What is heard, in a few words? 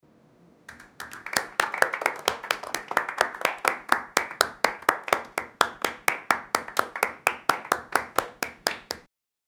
applause